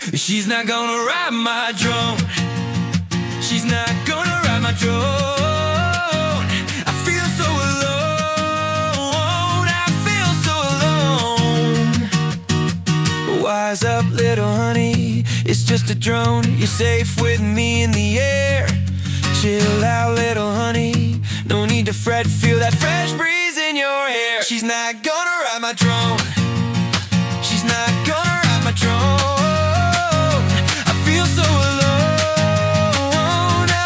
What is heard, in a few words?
Drone